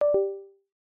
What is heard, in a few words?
Windows Hardware Remove